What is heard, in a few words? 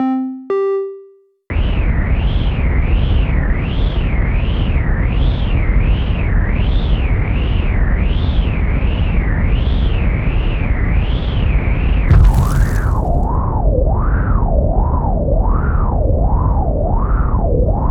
2015 Axial Update soni